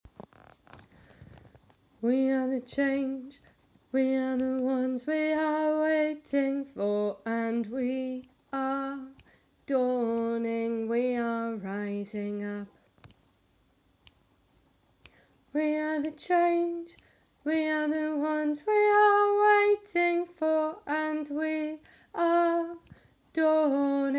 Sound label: Rising Up harmony parts; Extinction Rebellion